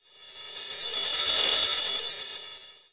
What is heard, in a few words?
reverse cymbal